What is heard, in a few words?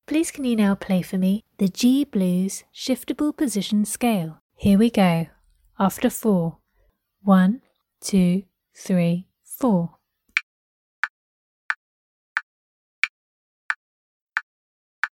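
organ, G blues shift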